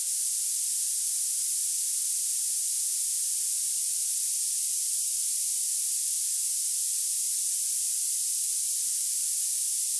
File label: noise hp